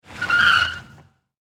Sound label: Car Tires Screech; LYN110 package of Power Car sounds; LynneMusic